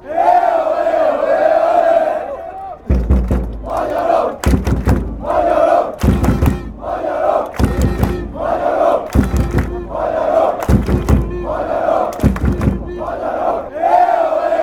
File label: chant, hungary, fanchants